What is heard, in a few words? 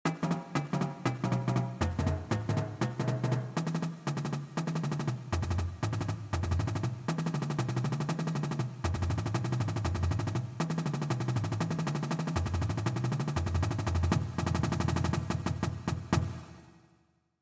SwingBeat